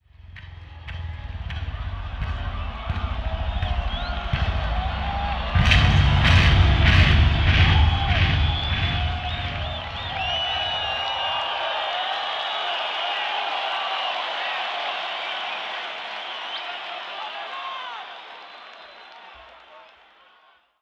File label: Bass Solo